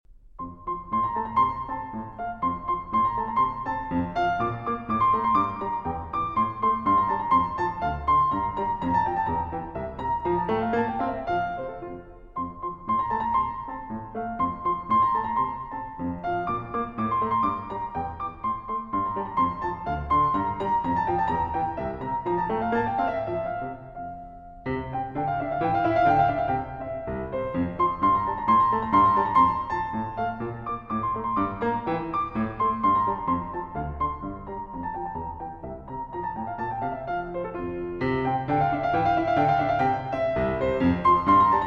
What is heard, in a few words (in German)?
Klavierstück in F Major